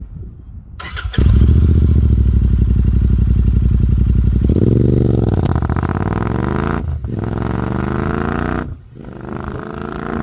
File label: meteor 650 exhaust sound